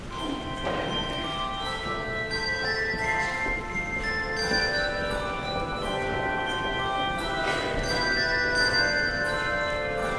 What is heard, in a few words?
Handbells; Song of Joy